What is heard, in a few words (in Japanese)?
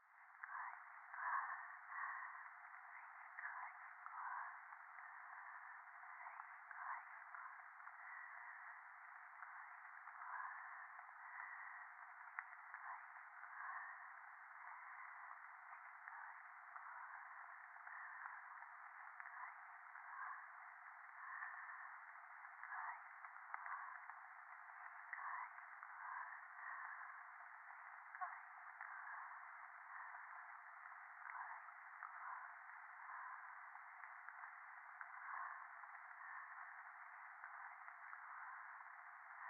電話 しこしこ 雨